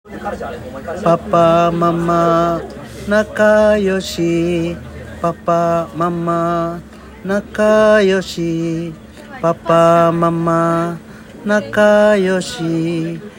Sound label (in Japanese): 仮歌